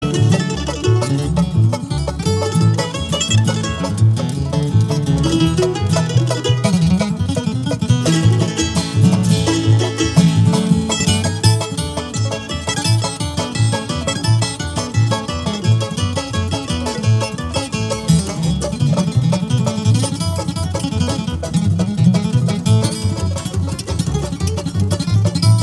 Mocking Banjo

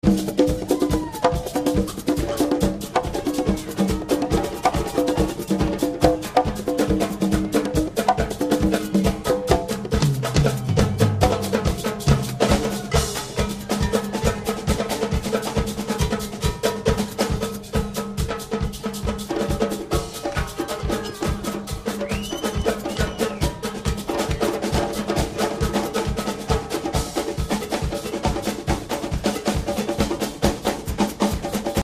Double Dig Percussion

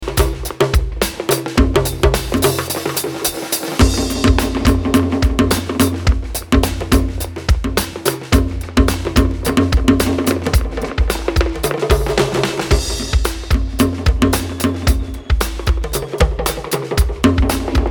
Drum Dub

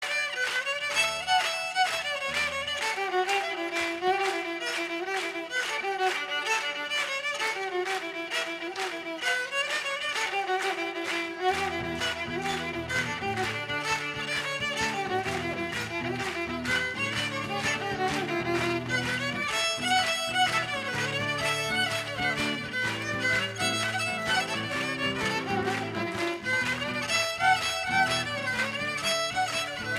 Jig

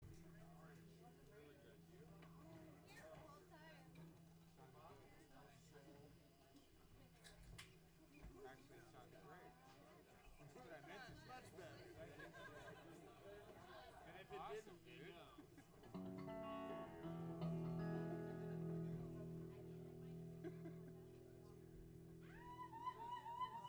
tuning

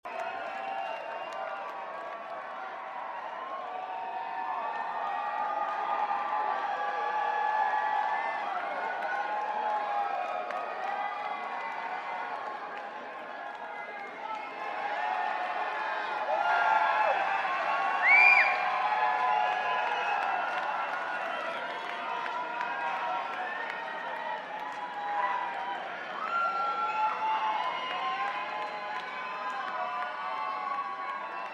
Crowd Banter

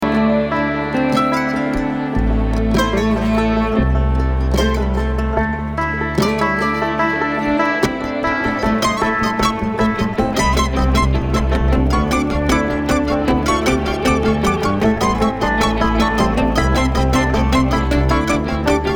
Traffic Jam

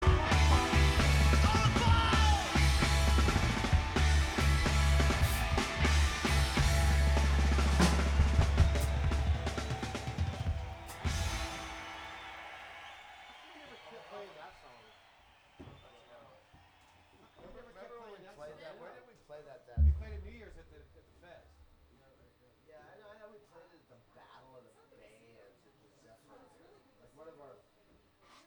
tuning

